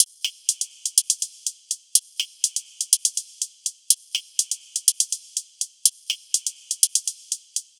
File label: Top Loop 123; ENZO Drum Signature Bundle Vol.1